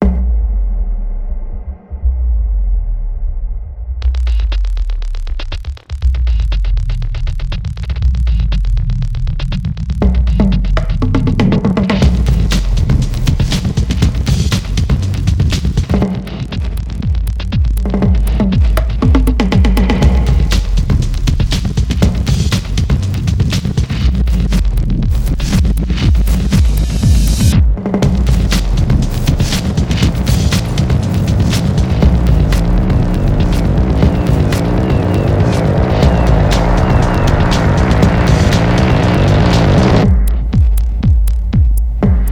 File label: Groove Box